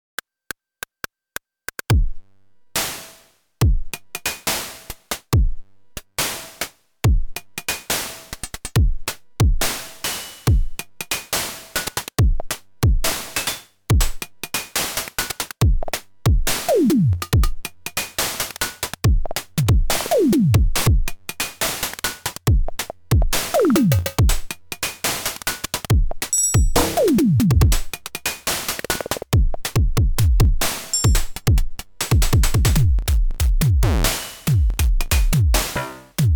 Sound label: drill type beat opl3; weeklybeats 2024